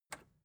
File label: record player power release on